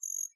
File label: Night Cricket